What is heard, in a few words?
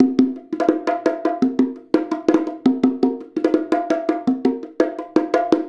Bongo; Essential Percussion